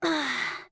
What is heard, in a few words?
Link Stretch; Link stretching; The Wind Waker; Zelda